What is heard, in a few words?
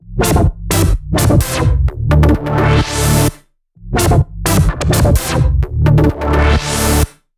128BPM Melody; Vengeance Samples; Freaked Kit